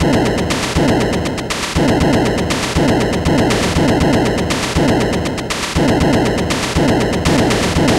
Atari 2600 Noise Drums

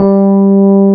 SUITCASE, Keyboards Old School

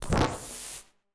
Casio, Glitched, malfunctioning synth

